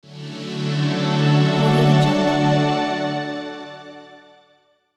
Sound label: logo